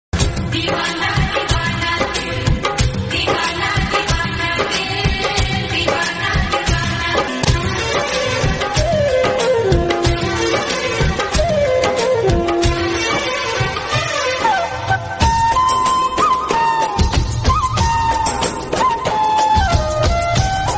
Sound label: Hindi rigtone